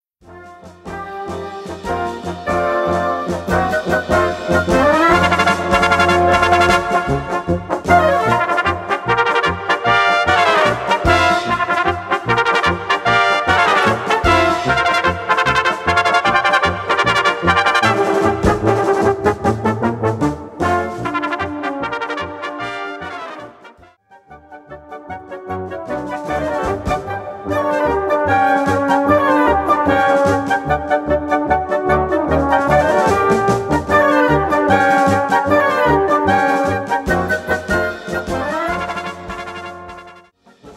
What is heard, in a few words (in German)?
Looping